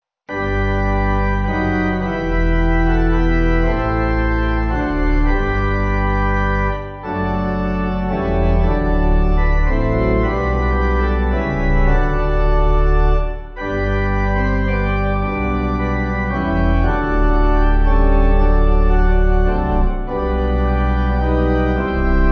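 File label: Pipe organ, church music backing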